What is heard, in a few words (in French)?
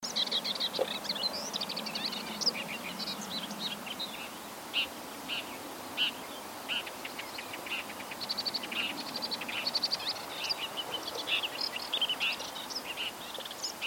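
hypolais pie grièche écorcheur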